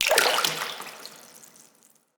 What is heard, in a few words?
Drop In Water; Player Instrument